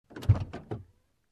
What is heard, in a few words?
Car Door Open